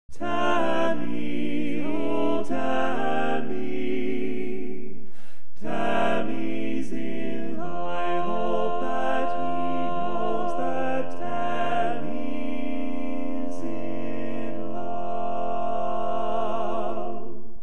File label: Bass, Barbershop